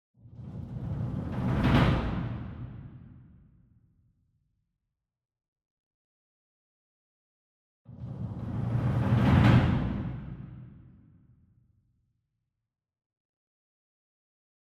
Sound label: Single Drum Verdi 66 Inch Roll Soft Beater Far; boom library; Cinematic Strikes Construction Kit